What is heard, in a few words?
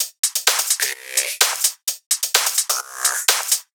tops 128; Anthemic.Electro; tops loops